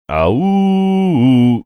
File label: making funny horn sound